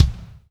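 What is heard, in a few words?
floor tom, left; Spectrasonics; Burning Grooves CD2; HIT ME KIT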